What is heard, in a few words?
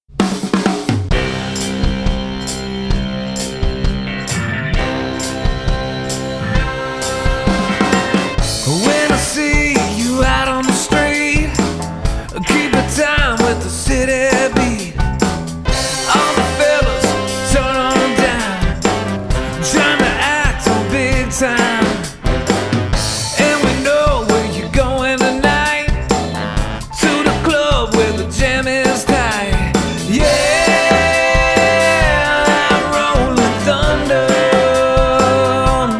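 Rolling Thunder with Vocals 132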